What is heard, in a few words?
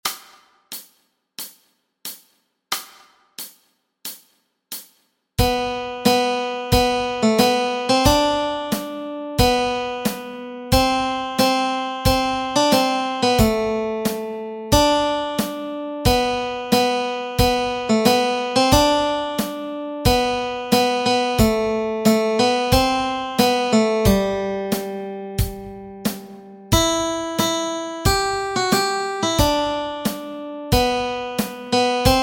melody